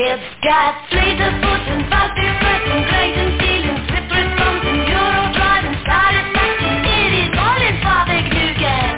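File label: jingle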